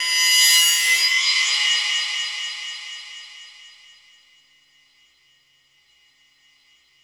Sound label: OIL CAN BO, BOWED METALS; Spectrasonic Distorted Reality 1